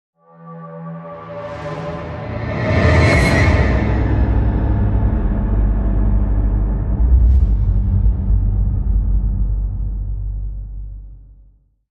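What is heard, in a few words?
Hit Swoosh; Refinery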